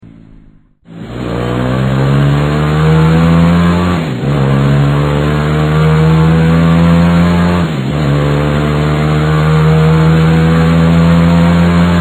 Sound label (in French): echappement; SCORPION